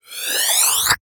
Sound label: Multimedia Internet CD-Rom Flash Whoosh